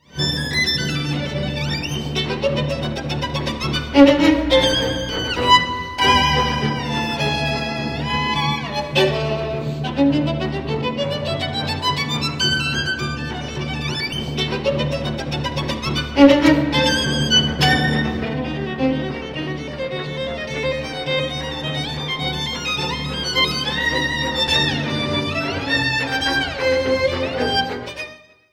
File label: concerto in d minor for violin and orchestra opening; op 47